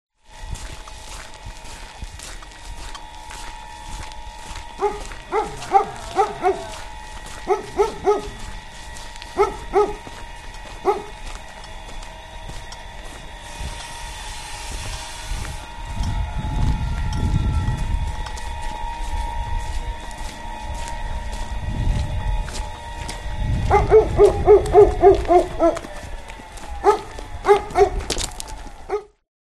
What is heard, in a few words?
contemporary polish village music